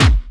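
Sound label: snare drum bass drum, Dance Mega Drums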